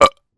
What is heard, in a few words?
human burp male forced short